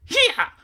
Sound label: rage